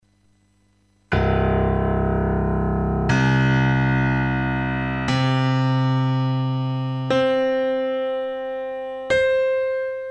Kurzweil PC 3; Pop piano kurzweil pc3

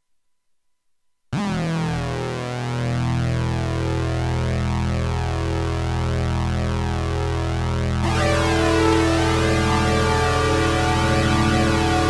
Clavia NordStage

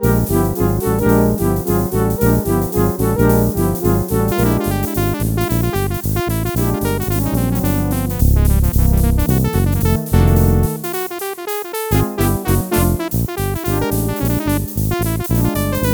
Apple GarageBand; synth

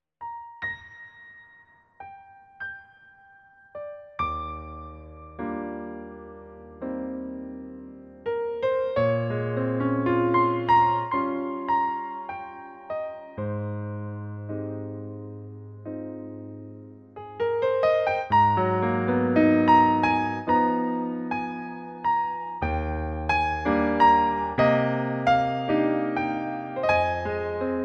Korg PA 2; k-sounds piano 2 su korg pa2x pro

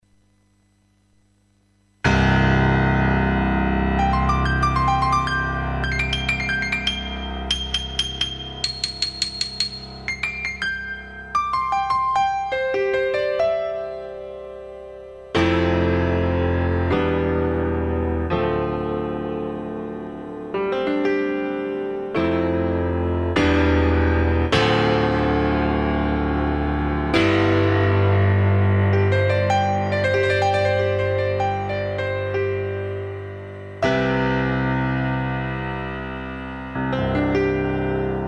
Kurzweil PC 3; Pop piano kurzweil pc3

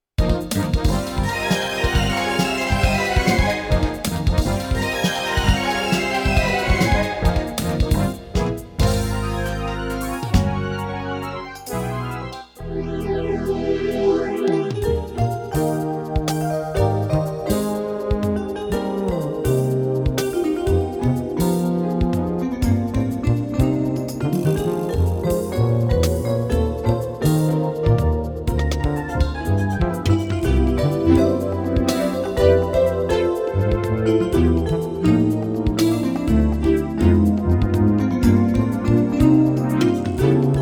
Yamaha Electone FX 20; electone hx-1

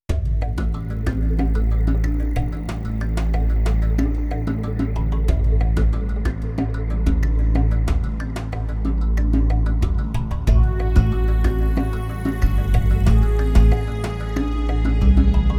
Korg M 3; demo realizzata con korg m3

